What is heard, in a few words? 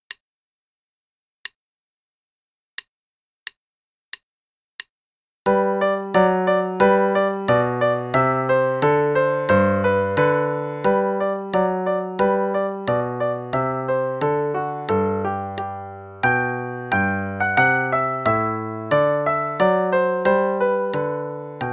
Russian Folksong (qn=90); Beethoven